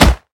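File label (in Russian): короткий чёткий резкий полёт бейсбольного мяча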